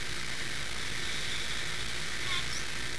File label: ghost